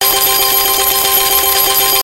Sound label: collect; All Ways Fruits